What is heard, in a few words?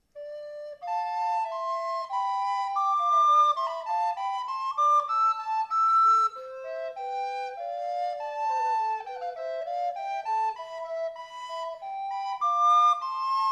Moeck Consort SA